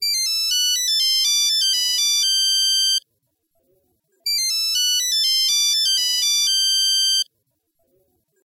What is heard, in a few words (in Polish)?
stara nokia tune